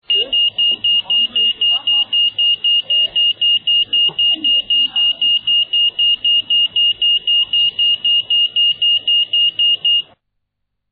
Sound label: talking crosswalks; manchester